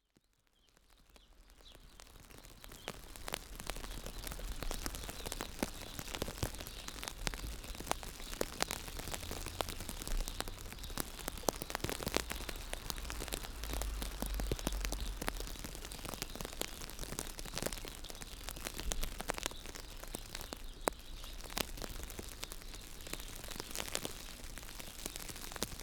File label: Rain on umbrella